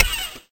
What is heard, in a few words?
car door open